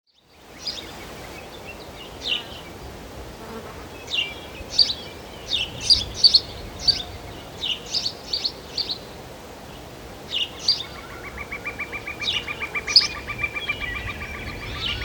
Nature, Ambience

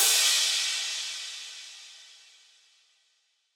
Crash; Cymbals; Cymatics; Future Bass Starter Pack; Drums - One Shots

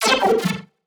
Retro FX; Cymatics; Video Game Sample Pack Vol 1

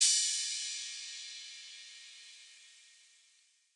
Ride

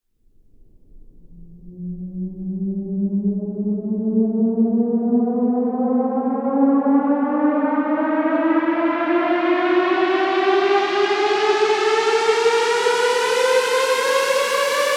Riser - 128 BPM D#; House; FX; Risers; Elevate Riser

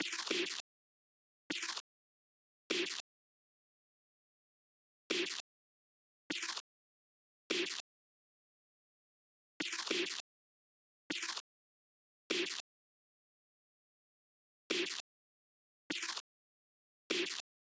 Drum Loop - 100 BPM Glitch; Midtempo; Carnage; Cymatics; ANALOG EVOLUTION Beta

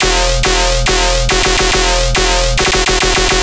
Bass Loop E 140BPM, Dubstep

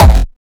kick oneshot lilmonster F; GhostHack

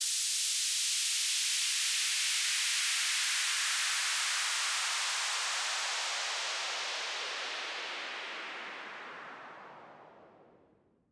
Downlifter; 122bpm A#min; Construction Kit; Ghosthack; Summer Freebie; Acapella Kit

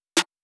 Drum Loop, 122 BPM Snare; Cymatics; Vibes II Drum Loop Collection; Connection Drum Loop

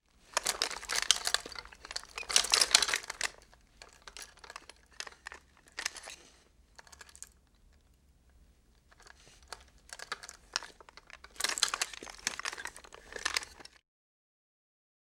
Gun Pistol Movement Rattle; Fairlight Sound Lib

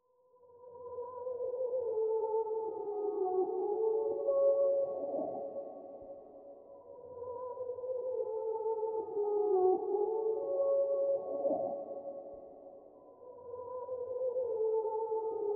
152 BPM D# Min Vocal Reversed; Loop Stems; Cymatics; Make You Mine

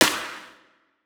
Snare, Dubstep Snares; GhostHack

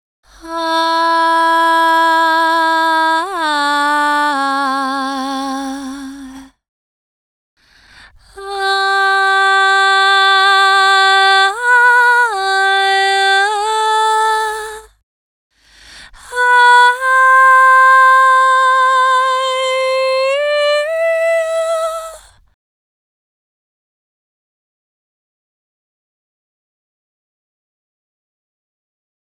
Intro & Outro Vocals - Fm 60Bpm; Construction Kits; Ghosthack; SkyRealm; Kit 04 - High Rock View

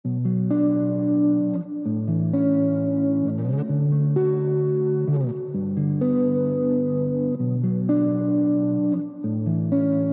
130 BPM D Maj Chords, Guitar; Melodies; Loop Stems; Cymatics; GHOST (Beta Pack)

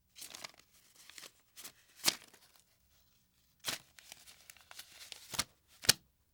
Money Handle; Fairlight Sound Lib

